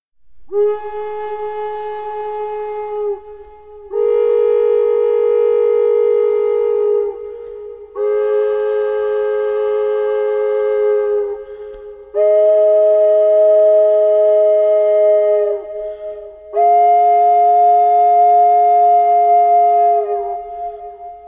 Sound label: do drone, indian flute